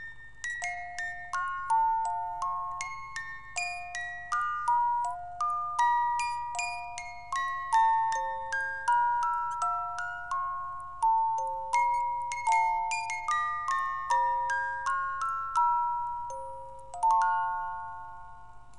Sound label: Serenade, Music Box